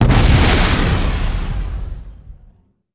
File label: explosion small; Emperor - Battle for Dune